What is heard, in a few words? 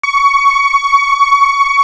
MIDI pad, synth pad; InVision Interactive; Lightware VOL-1; Instruments & Percussions